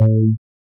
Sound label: Ooh